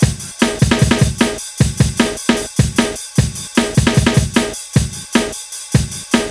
drums, Hardcore Breakbeat, 152; Big Fish Audio; Hardcore Breakbeat Action; Robot Live

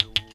KHOMUS M, KHOMUS NT; USB Soundscan vol.60; Asian Traditions; AKAI

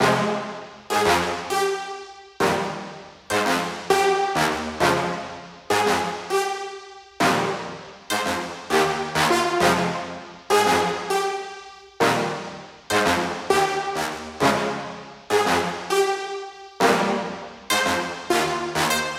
Brass Loop 100BPM Fmin; Brasses; Instrument Loops; 99Sounds; Monosounds

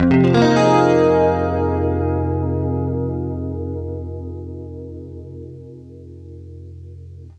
CLEAN FM9, Guitar; East West; Steve Stevens Guitar Samples Collection

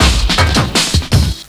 LOOP SD, Drum & Bass, 160 BPM

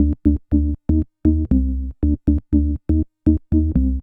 SH101 Bass Loop (120BPM), retro house

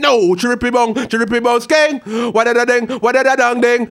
RAGGA MUFF, B maj; Sound & Vision - Gigapack II

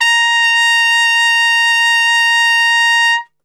A#3 PIC STR L, Brass; Best Service; Brass Super Section

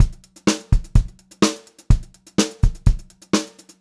126 PO, Pop-Rock Drumloops

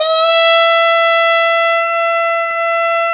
BRS TP, Roland, TRUMPETS; Roland - LA Composer vol. 1

